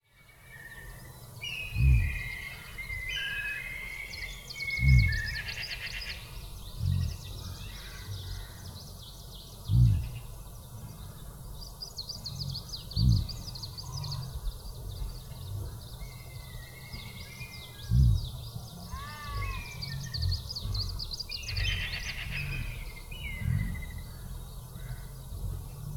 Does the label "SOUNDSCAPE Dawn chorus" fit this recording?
Yes